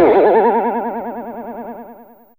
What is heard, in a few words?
Spring, Fairlight CMI IIe Effects; Effects3